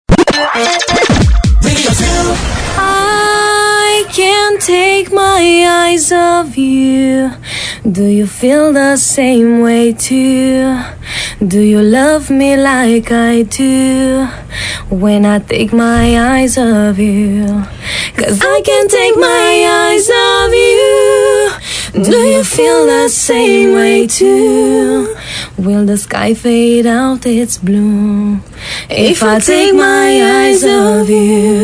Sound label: acapella